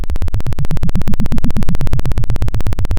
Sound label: Sound of a plane taking off